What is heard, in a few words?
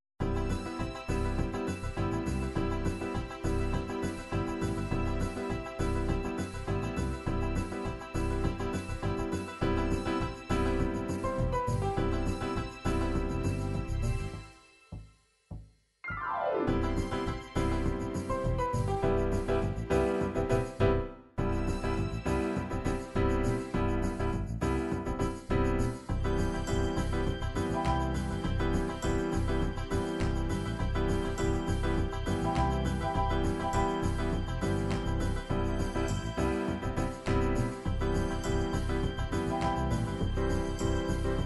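disco pop, midi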